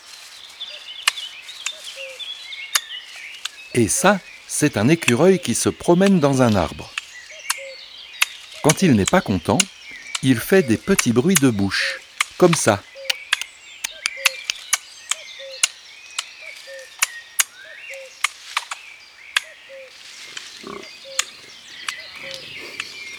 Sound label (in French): Imagier Ecureuil